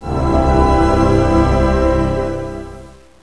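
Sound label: orchestra; classical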